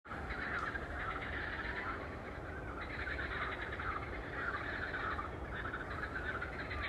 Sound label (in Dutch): kikkers; Joren